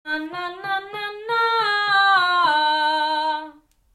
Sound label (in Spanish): Belting Na